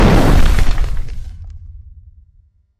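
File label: powerful impact explosion, meteorite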